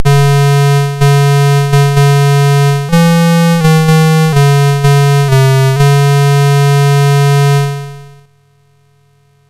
sid MOS6581R4; Bear George

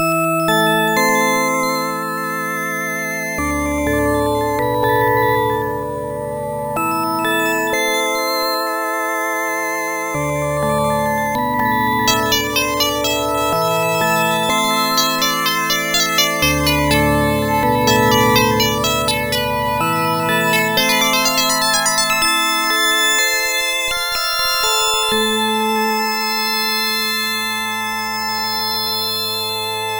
magic meditate; Sonix Music Driver

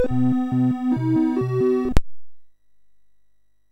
BASIC sid MOS6581R3; Ahoy Magazine

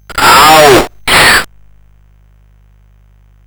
sid CSG8580R5; Out on a Limb

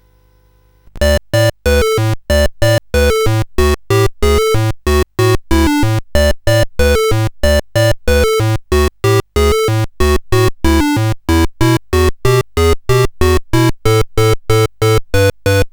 sid CSG8580R5; Frogger II-Three Deep

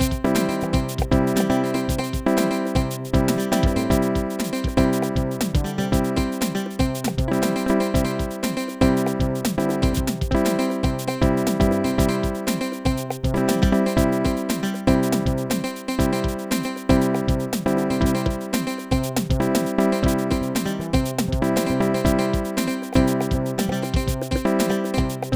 slow funk style; SuperJAM!

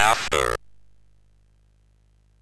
sid MOS6581R2; Cave of the Word Wizard Set 3

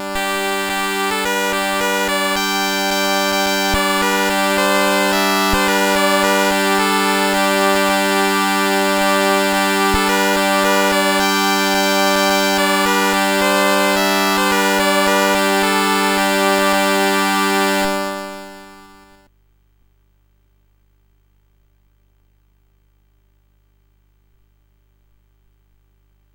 sid, CSG8580R5; Highland Games

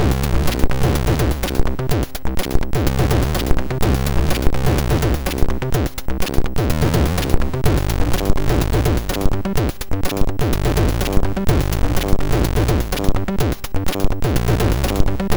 Loader Music

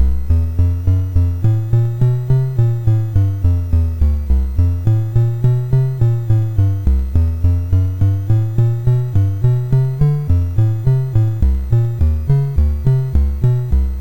Boogie Bass BASIC, sid MOS6581R4

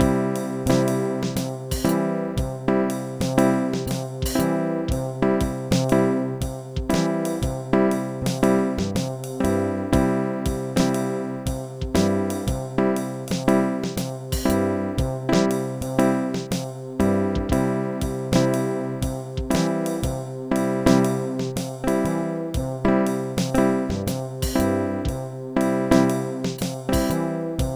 jazz in 5 style; SuperJAM!

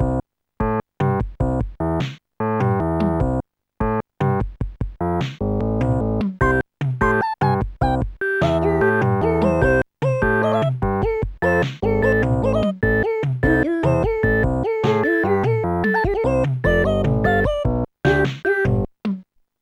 lounge lizard; Instant Music

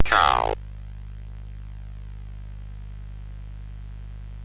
sid CSG8580R5

